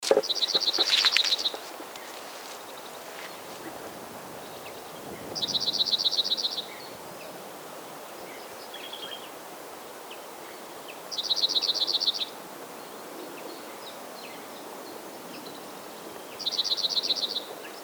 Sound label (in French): bruant zizi; une plaine une foret